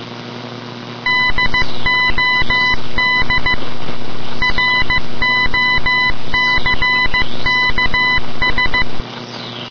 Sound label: radio morse; ambience; Source Engine; dod